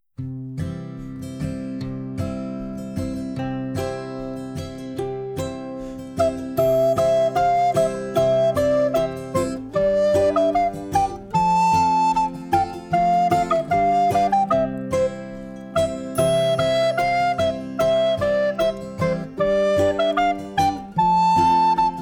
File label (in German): Stärnsinger Lied PLAYBACK